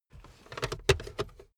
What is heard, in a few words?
car backseat table pull up unsteady